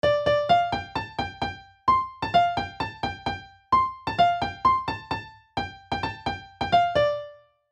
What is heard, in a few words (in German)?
melodie froehlich beispiel